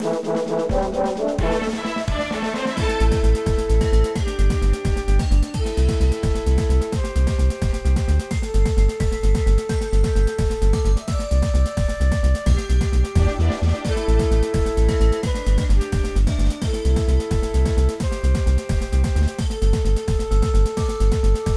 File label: adventure complete; Below Kryll